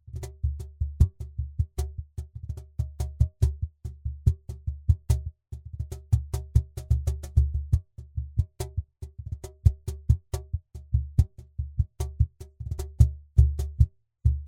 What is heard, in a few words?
BL8 Omni Cajon Modern